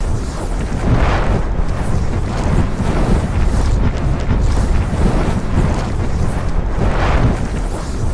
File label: hide loop, void